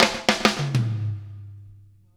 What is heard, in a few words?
Fills 103; Zero-G; Monster Beats